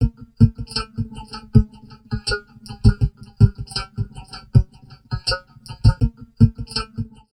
VOCOD, Drum Loops Crazy Processed

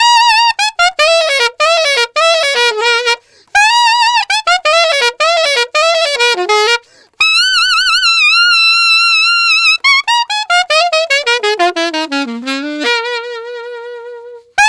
Alto Solo in Bb, Alto Sax, Jazz; Sonic Foundry (Sony Creative Software)

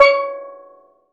Steel Drum C#4; Planet Earth; Digital Sound Factory Planet Earth

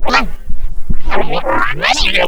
SCRATCH, Funky Vocals; AKAI; USB Soundscan vol.18; FX DELIR

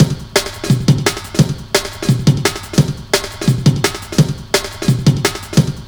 172 LOOP, Explosive Jungle; USB Soundscan vol.05; AKAI

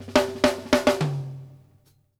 OFF SNARE, DRUMWORKS FILLS 108

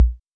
Kick, Korg SR-120; Drums

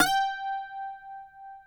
CUATRO; Spectrasonics; Hans Zimmer Guitars VOL-2

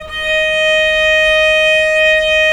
VL D#4, VIOLE, Historical Instruments; USB Soundscan vol.23

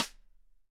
Sidestick; Digital Sound Factory

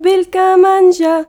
YOUNGIRL, Arabian Traditions

